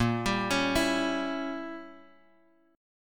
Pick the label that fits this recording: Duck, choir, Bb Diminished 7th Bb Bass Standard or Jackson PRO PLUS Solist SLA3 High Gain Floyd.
Bb Diminished 7th Bb Bass Standard